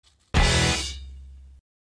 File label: guitar hit